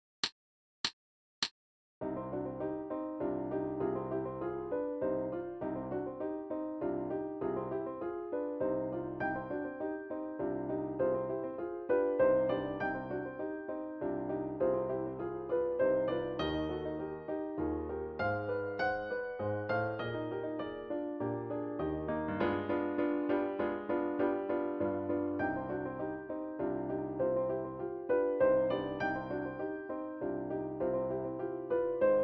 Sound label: Clarinet; Unfinished Symphony; Schubert